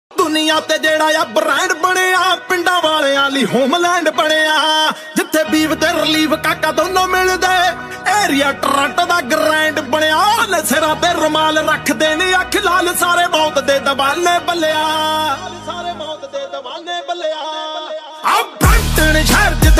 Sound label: Punjabi Beats Ringtone